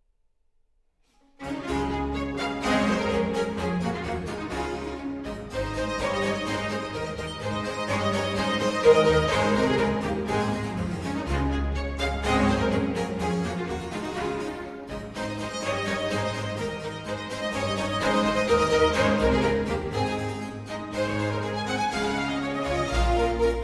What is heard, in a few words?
Suite in D Major For Strings III. Bourée - Trio